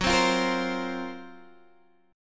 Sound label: Gb Major 7th Flat 5th, Guitar, Open G; chordshape; down